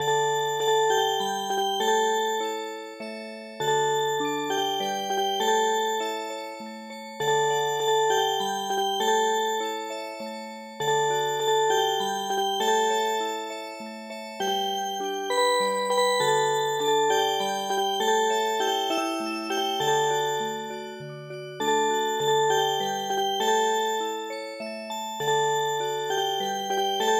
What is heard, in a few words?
Music Box